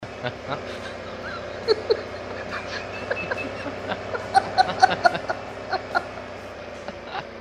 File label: laughing